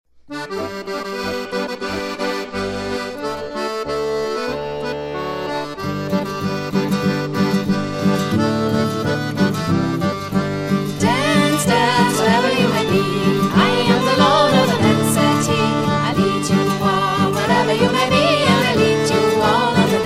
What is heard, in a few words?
Jig Of Slurs